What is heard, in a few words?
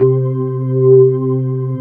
B3 HAM, Keyboards Old School